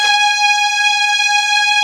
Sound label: SPARCO VIOLIN